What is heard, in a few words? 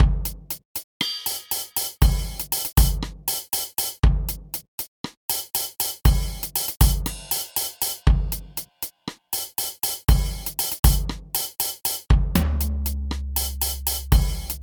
Jazz Hiphop Routine